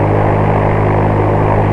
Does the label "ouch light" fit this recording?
No